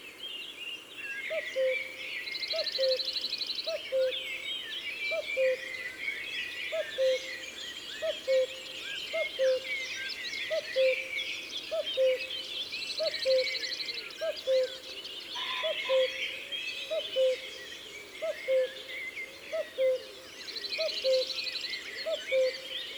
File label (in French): Coucou gris; ABC des chants d oiseaux